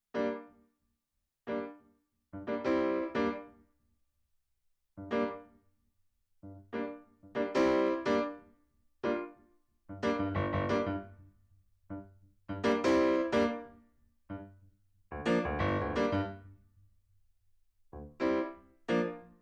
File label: Delay Piano; Fusionbox